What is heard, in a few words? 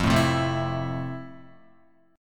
F Major 7th Guitar; strummed; chord; FM7; JGuitar